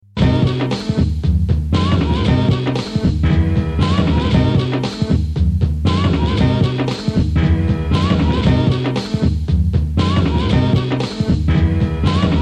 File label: beat sketch